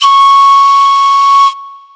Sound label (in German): D Pfeife 1 lang